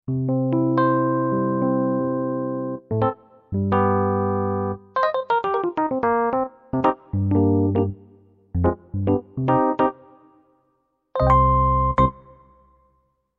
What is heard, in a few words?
Bright; KORG; EP Bright mff